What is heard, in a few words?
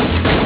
close door